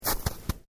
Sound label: Footsteps Boots Snow Shallow Step